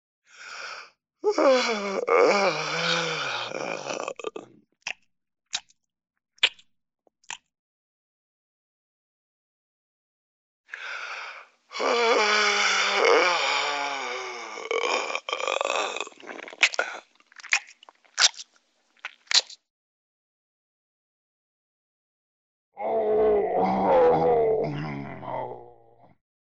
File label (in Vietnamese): tieng ngap cua nguoi